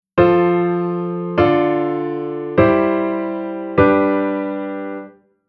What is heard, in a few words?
Chord